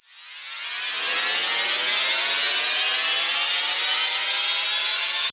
gliss spatial asc

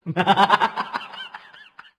rire satanique

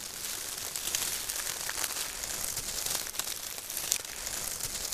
feuilles

